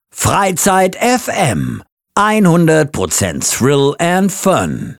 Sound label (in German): Jingle; Freizeit FM 100